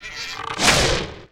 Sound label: fire; Return of Legends; mir2